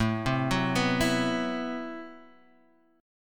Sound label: A Minor 6th Add 9th A Guitar Half Step Down